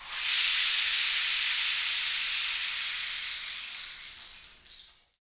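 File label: percussion rain stick; Roland L-CD701; Water Perc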